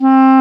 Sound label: WND CLARI, Clarinets, Clar Bryt; Roland LCDP04 Orchestral Winds; 90 s Sample CDs